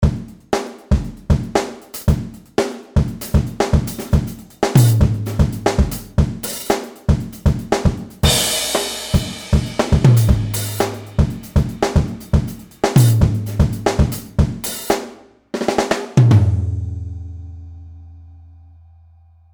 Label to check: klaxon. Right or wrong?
Wrong